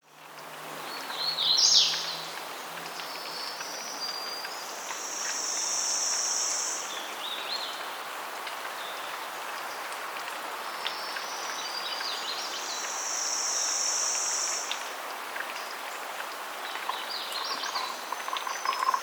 Red River Gorge Nada Tunnel Soundscape

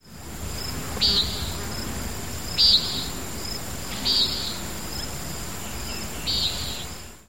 Common Nighthawk; Carrizo Canyon CO

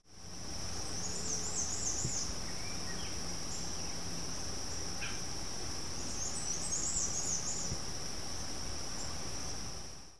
Black and White Warbler

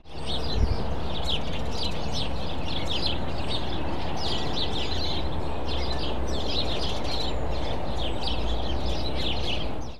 House Sparrow flock; Lower Latham

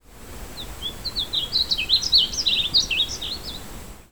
Warbling Vireo; Picture Canyon CO

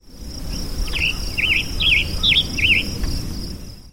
Western Tanager; Cone Mtn BBS

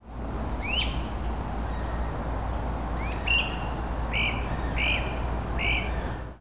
Great Crested Flycatcher; Laxahatchee

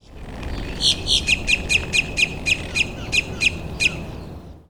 Boat tailed Grackle; Markham Pk FL

